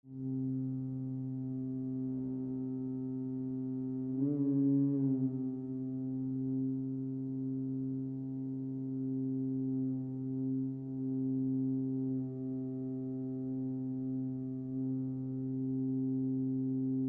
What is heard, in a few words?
noise progress